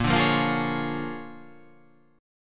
A sharp 7th Sharp 9th

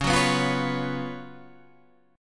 Gb Major 7th Suspended 4th Db, guitar

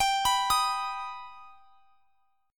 G Augmented G, chord; arpeggiated

